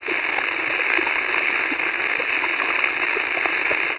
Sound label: static